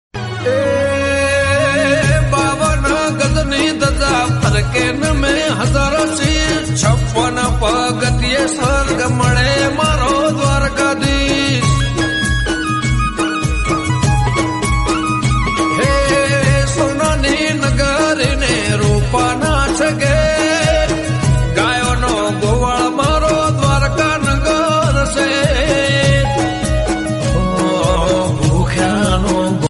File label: Devotional Gujarati